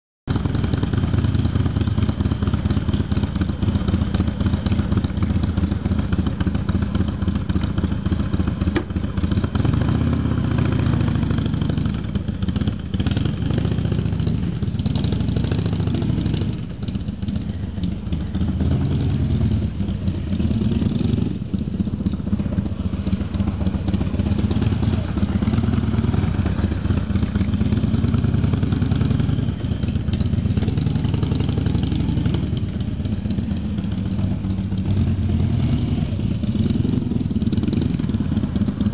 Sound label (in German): Harley Street Bob mit Eagle M7 ohne DB Killer